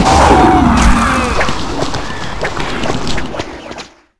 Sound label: DEATH TAIL SWIPES, aliens queen; svencoop